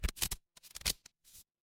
paper fabric card shuffle

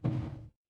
impact thud

